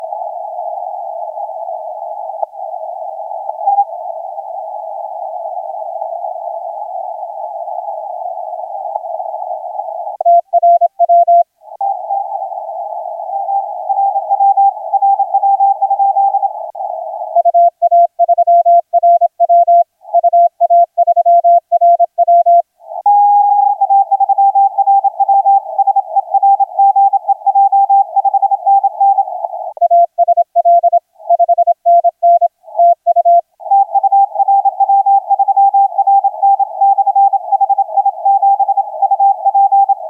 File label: 20m CW